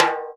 snare, timbales; Univers Sons; Basicussions

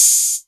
808 Hat O; Drums

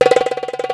tabla trem, Yamaha Motif ES drum machine; 300 Drum Machines; Yamaha Motif ES-Series

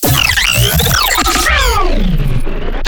glitch stab; Virtual Riot; Glitch Stabs

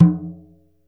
MALAY DRUM; Spectrasonics - Heart of Asia CD2

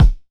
Floor Money Kick; Airwindows

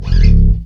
BS CHPSTK, Chapman Stick

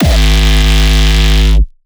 Hardstyle, kick

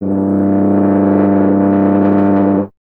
BRS TUBA, Solo Instruments

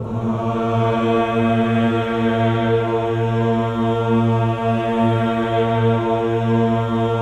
VOWEL; Spectrasonics; Symphony of Voices; OCT VOWEL

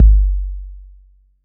BD 808

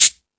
Beat Box; E-MU Pro-Cussion

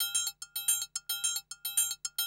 110, Classic Drum Loops

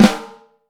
FLAM, Vintage Snare Drum; Q Up Arts; Johnny.C's Vintage Snare Drum; 5.5X14 SLR

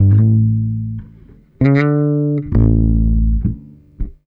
bass, Roots Funk; USB Soundscan vol.41; AKAI

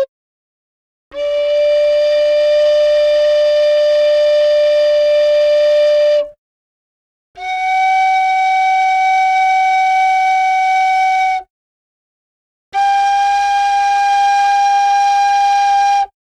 Gamelan; Propeller Island - Complete Gamelan

